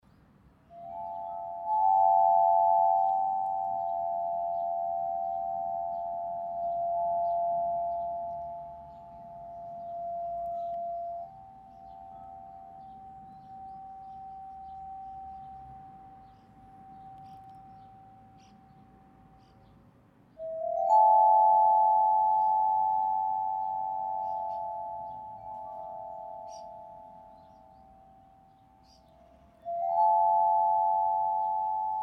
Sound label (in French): Harpe divine Magie eau